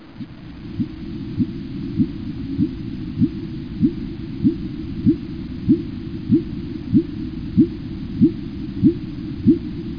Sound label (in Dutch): dwergvinvis; walvisstrandingen